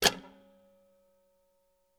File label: Old Metal Clock; 99Sounds; The Warehouse